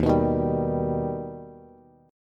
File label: Eb Augmented Eb, Bass; strummed; JGuitar